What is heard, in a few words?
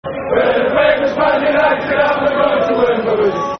Football Chant (Soccer Song), Man United; Wembley